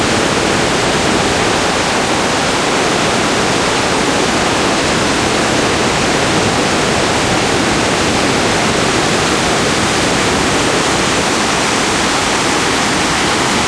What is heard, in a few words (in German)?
rheinfall